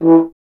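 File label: brass French horn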